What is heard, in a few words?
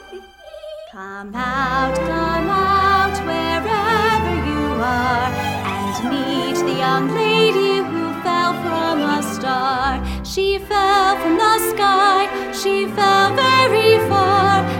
vocal; munchkinland